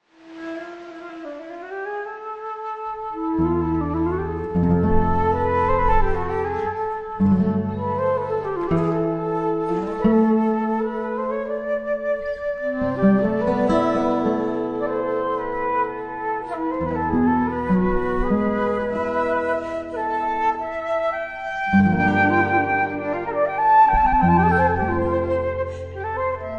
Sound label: 2 Flutes Guitar